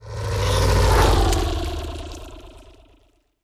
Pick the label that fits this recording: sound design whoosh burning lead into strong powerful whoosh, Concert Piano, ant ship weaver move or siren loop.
ant ship weaver move